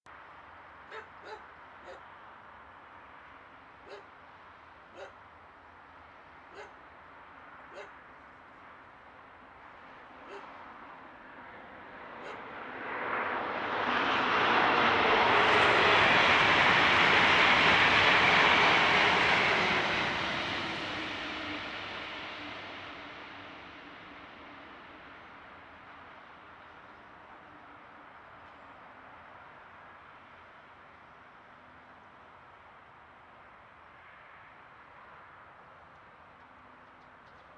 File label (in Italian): Treno mono; Eigenmike; A-weighted; Nuova Strada